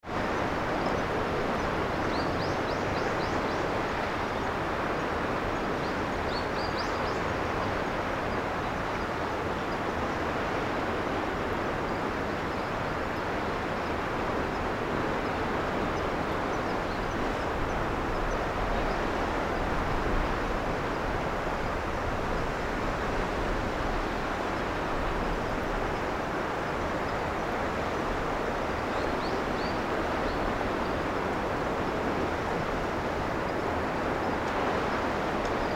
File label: wave